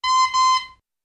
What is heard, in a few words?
Old Car Horns Single; Various Old Car Horns - Singles & Doubles - 8 Types ( Beeps, Honks, 'aaooga', Etc... )